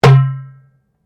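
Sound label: Drum Darabuka Strike forte, Egyptian Darabuka Drum; Drums; Musical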